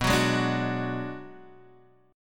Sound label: B Minor 6th B, Guitar Half Step Down